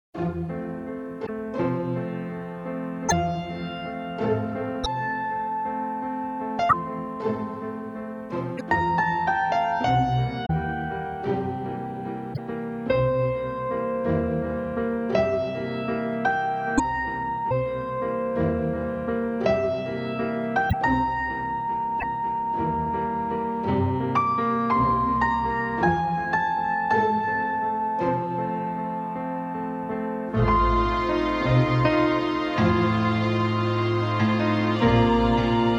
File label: Easy Listening & Classical; Sound Ideas